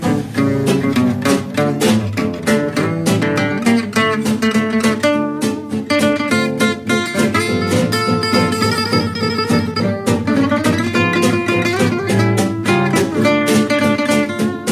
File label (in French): Musique jazz manouche